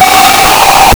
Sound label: wraith strike spectral wh, attack